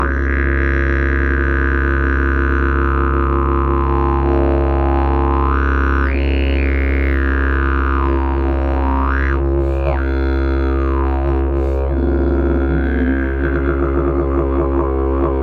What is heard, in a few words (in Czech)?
eukalyptus bluegum, didgeridoo; exiv